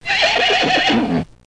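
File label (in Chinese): ma jiaosheng, horse neighing; jiachujiaosheng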